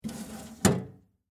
Mail Box Close, Household, Mail, Box, Close, Metal; Miscellaneous Household Sound Effects; BlastWave